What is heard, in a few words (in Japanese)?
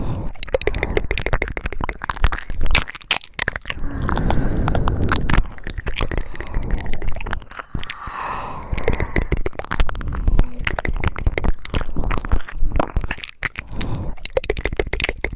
レロレロ